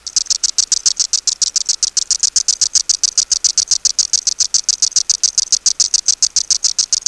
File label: cassini; Michigan Cicadas; Periodical